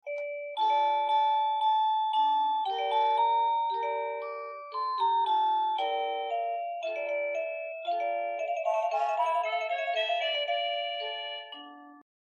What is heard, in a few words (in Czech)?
melodie